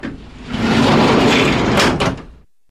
tiroir fermeture

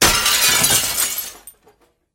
casser fenêtre

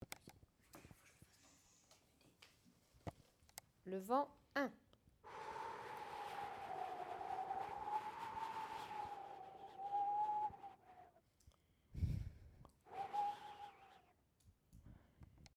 le vent

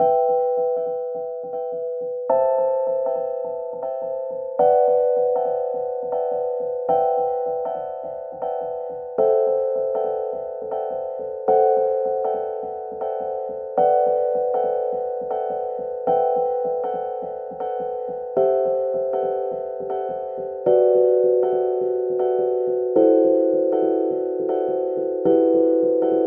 accords

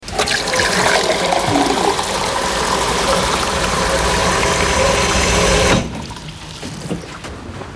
remplir verre au robinet

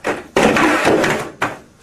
chute

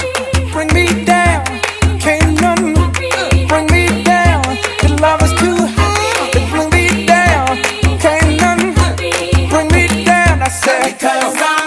pont choeur

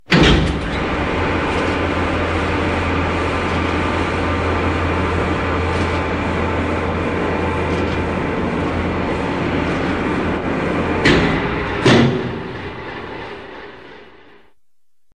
monte charge

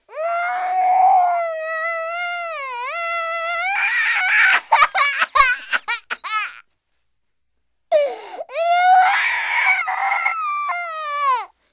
pleurs enfants

